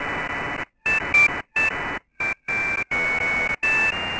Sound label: pager